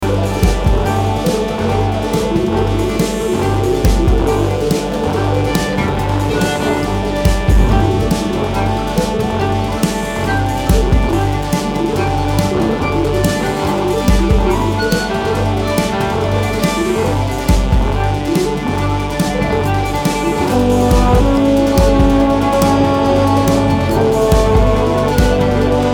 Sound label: house music